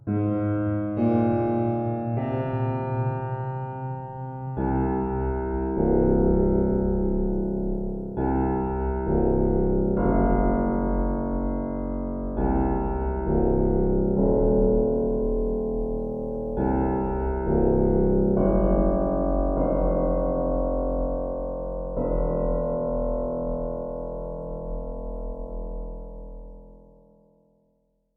Tension Piano

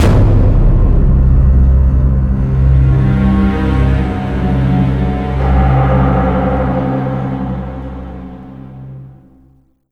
Fail; Pimp My Ride; PS2; PSP; Wii; Xbox 360

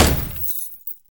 Lockbreak; The Binding of Isaac

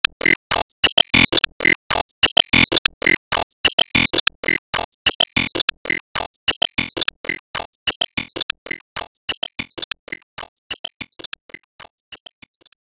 Enemy Roadmechanic Drill

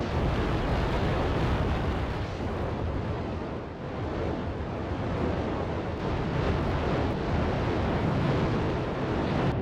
Radio Interference; Lethal Company

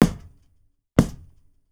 Ball Kick; Smeshariki: Cartoon Studio

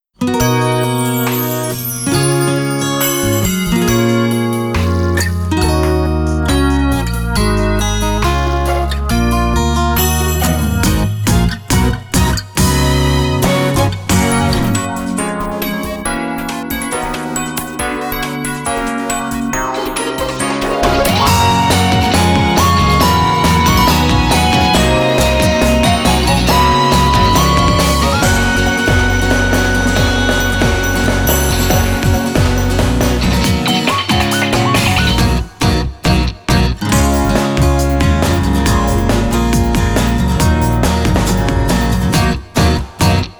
Instrumental ver.; la campanella della benedizione character song cd vol1; twinkletwinkle minette

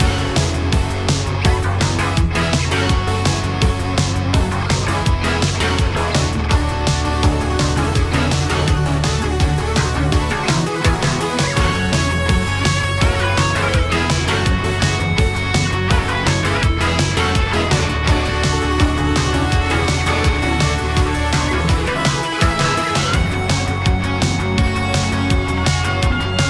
Airport Loop; Boomer Zone

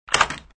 Unlock; SFX Environment

